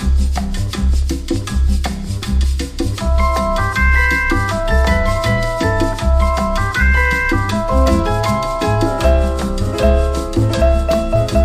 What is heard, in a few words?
CARNIVAL IN PERCUSSION